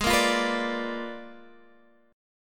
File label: A sharp 7th Flat 9th G sharp